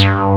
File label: PULSE Q BS, TECHNO; Pro-Rec - Synthsonic; Z1 TECHNO